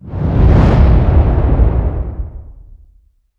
woosh

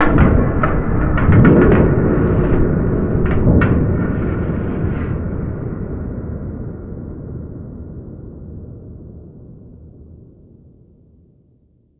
big hit far

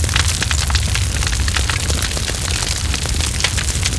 fire; deck17

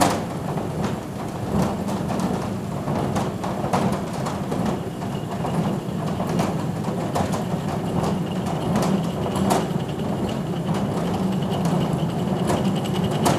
ambience conveyor

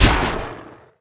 turret

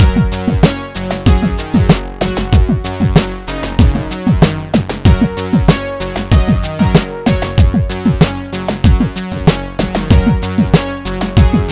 music loop; clack

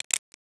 357 idle fidget pull

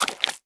player step

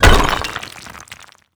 rock hit

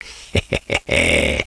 laugh

